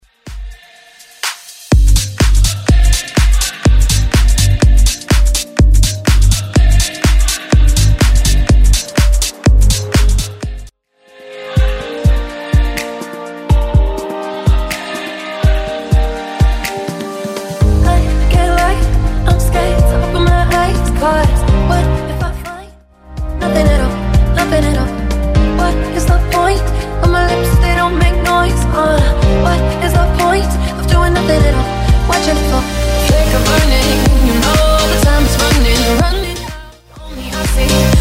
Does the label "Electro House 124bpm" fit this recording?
Yes